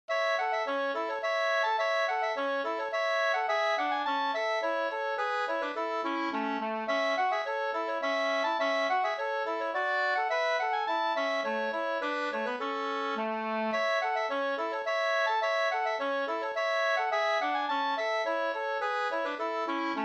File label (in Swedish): slängpolska